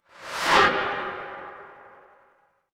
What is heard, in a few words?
transition whoosh